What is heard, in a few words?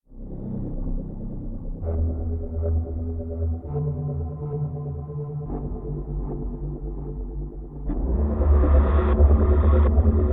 glow atmospheric background